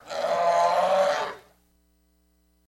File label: Camel Trumpeting Cal; camels